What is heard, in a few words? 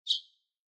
Bird Chirp; SoundsCrate